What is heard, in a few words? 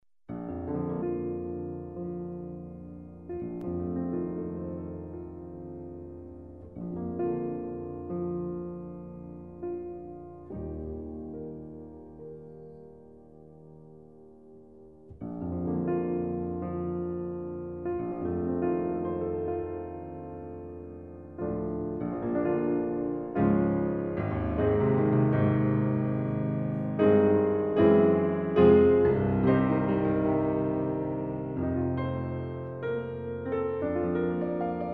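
quintet strings; fibich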